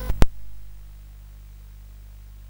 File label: sid CSG8580R5; Gryzor; hvsc